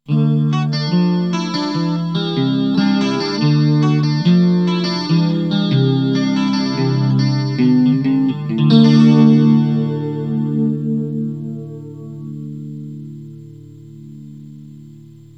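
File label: zombie chorus slow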